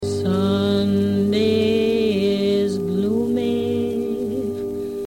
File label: gloomy